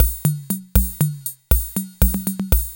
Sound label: 5-4 BEAT, KR55; INIS - Funky Xtreams; KR55 LP2